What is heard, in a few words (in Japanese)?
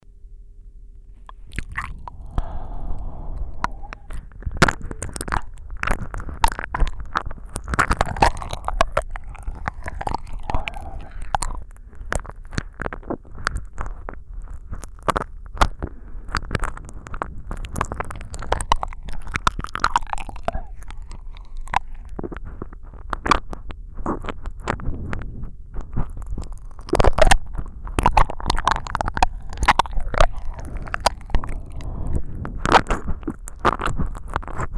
右耳舐め; 加工なし; ノイズ処理なし